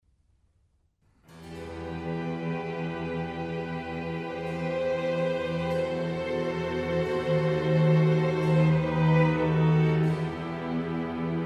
Concerto for Strings in E minor; RV133; Antonio Vivaldi

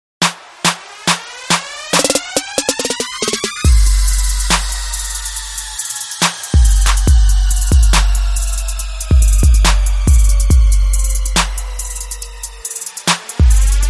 Beat

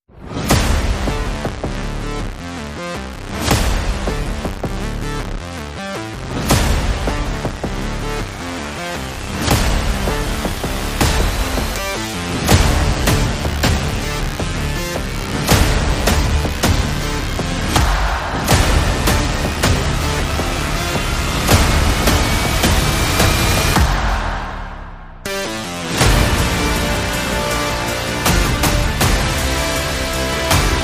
No Strings

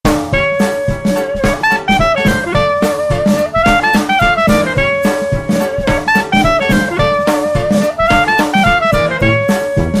Clarinet Jam

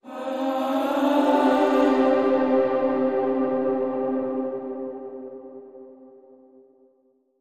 Link Sting

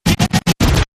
Short Stutter

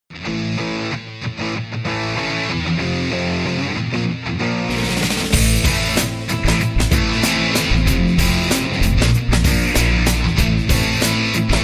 Heavy Licks

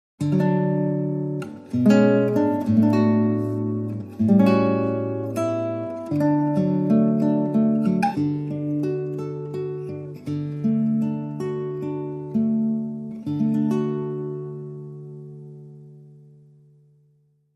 Solo Guitar

